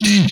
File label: Explosion; Space Blasters2D